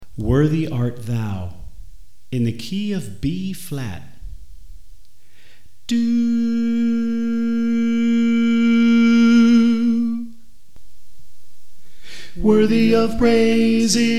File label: Tenor